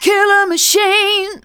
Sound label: vocals sung; Techno Trance Essentials